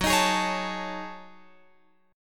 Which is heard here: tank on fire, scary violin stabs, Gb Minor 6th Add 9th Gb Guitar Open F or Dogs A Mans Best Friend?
Gb Minor 6th Add 9th Gb Guitar Open F